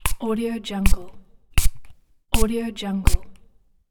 perfume spray

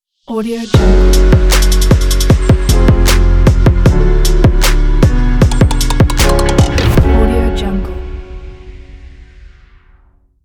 dark hip hop logo

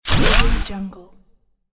scifi weapon reload sound

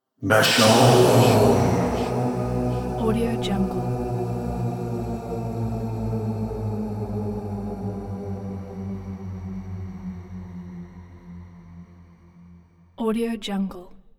dark game voice spell chant group extended male; beshohm

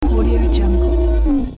intense hoarse and fluttery cry or bellow; sound ideas

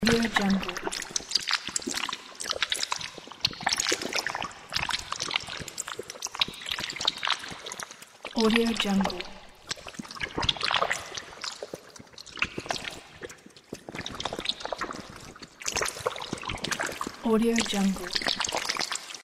organic texture

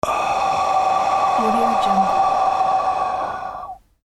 deep exhale

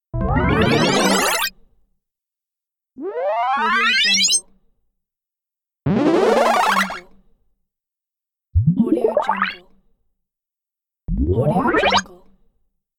excited power up sfx

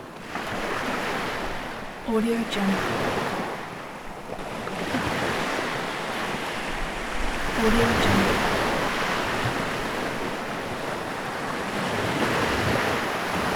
ocean ambience

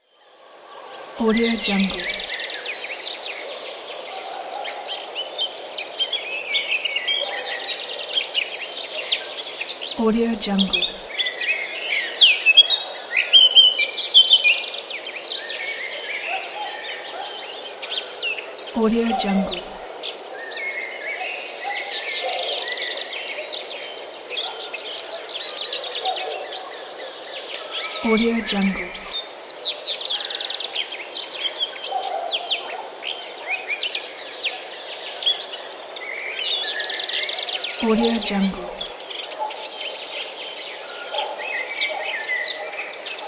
birds in forest near village

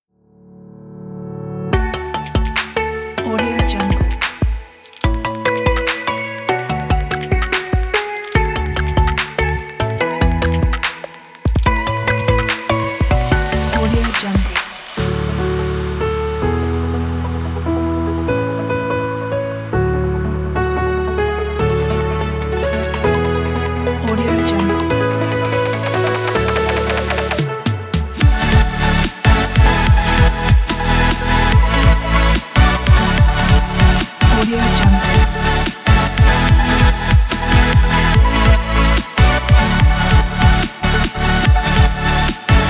summer chill vlog

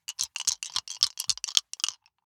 dice big three rattle in hand closed; foley; smartsoundfx

